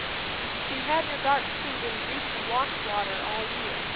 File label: noisy AWGN SNR0; gv oversmoothing